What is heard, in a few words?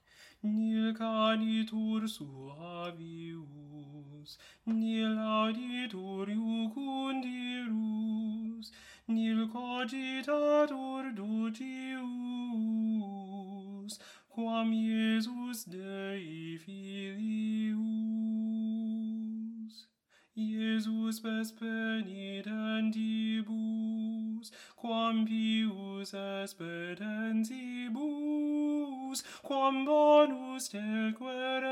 Jesu Dulcis Memoria Tenor